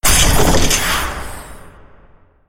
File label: unlock level; pond5